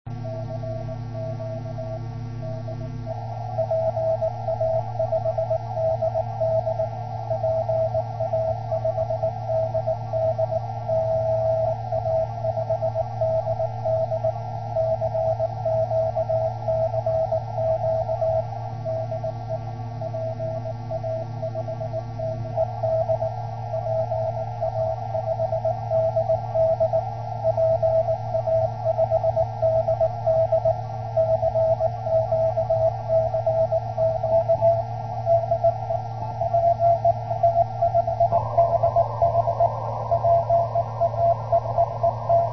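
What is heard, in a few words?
CW cq cq, 432 MHz, amateur radio; cq cq dir OK; PA5DD JO22IC 716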